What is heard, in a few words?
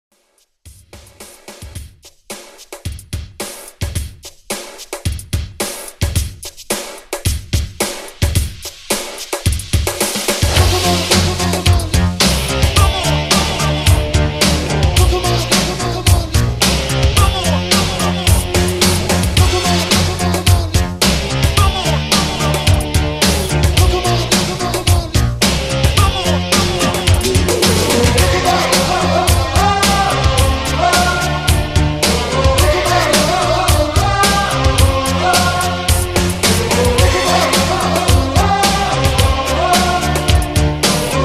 80s Pop Disco Redrum 109 BPM